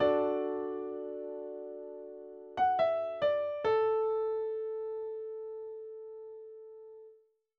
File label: instrumental short